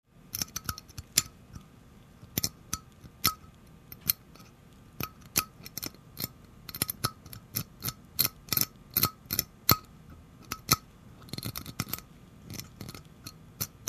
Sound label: Scratching and removing deposits